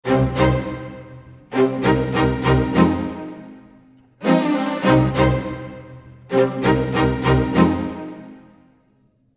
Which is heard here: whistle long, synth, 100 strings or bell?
100 strings